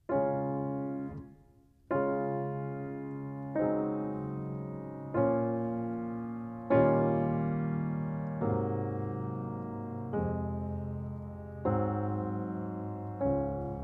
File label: To A Wild Rose (Accomp), trumpet; The Canadian Brass Book Of Easy Trumpet Solos; Hal Leonard